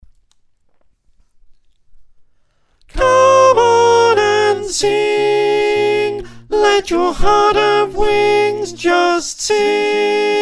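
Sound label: Soprano, choir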